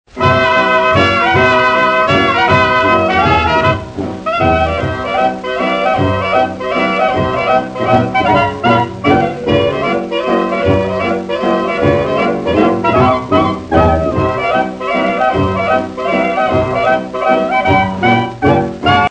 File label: CLARINET LAENDLER